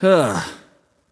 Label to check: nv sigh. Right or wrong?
Right